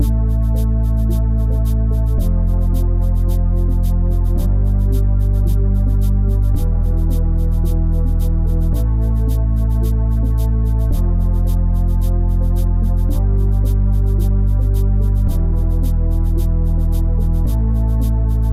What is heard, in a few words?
Bass Vector Cinematic 110BPM Am